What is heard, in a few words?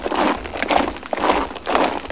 Troops Marching; CManual-3.0